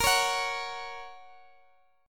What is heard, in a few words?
Bb Minor Sharp 5th Bb down Guitar Standard; strummed; JGuitar